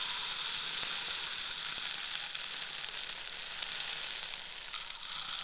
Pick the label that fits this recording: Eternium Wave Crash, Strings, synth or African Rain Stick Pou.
African Rain Stick Pou